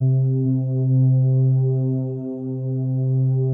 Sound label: pad, atmo pads; Best Service; XX Large